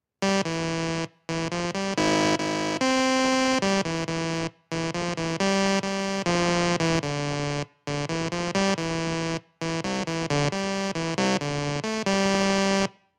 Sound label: computer music; csirac; how australia played the worlds first music on a computer